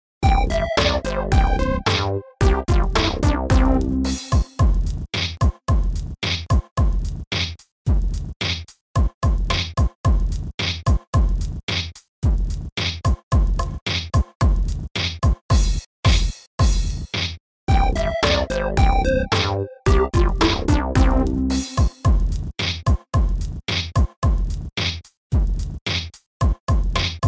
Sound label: karaoke; school